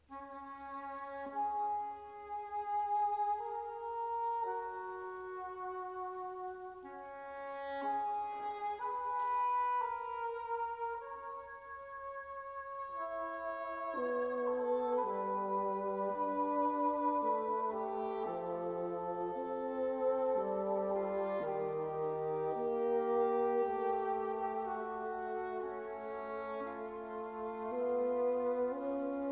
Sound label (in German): serenade fuer oboe fagott und harfe berceuse